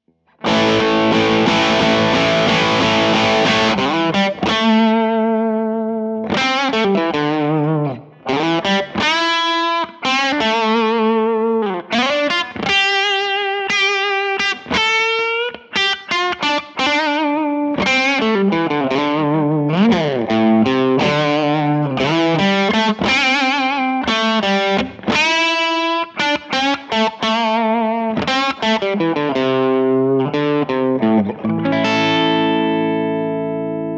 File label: characteristic sound; jensentone